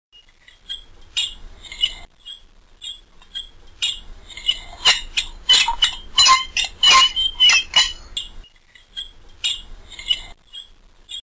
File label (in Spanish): plato cuchillo; sonidos insoportables